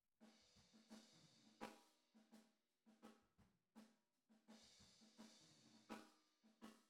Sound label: TB GTR